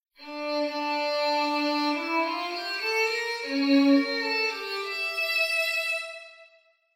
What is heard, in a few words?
short violin